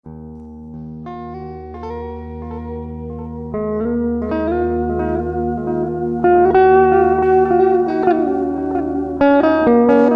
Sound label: delay